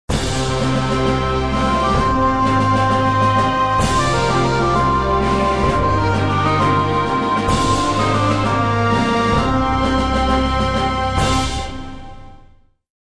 Industrial Combat; Civilization Revolution; Xbox

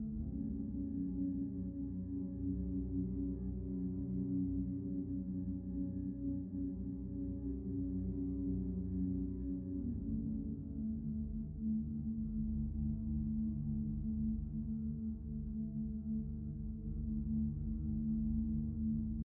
brunchcanyon treebuild loop 100bpm CMajorMinor

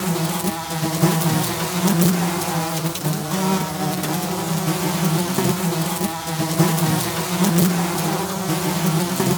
rotten food flies

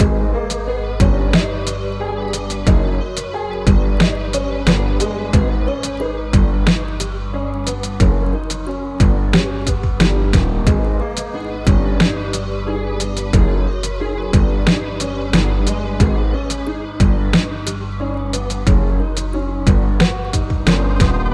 Game Over; Friday Night Funkin Hazy River linux macos windows gamerip 2022

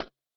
Footstep

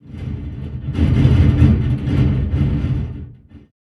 random pipe

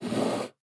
ill window move up

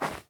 Ground short; Devour

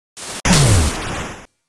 Explosion (Self-Destruction); 爆発音(自爆)

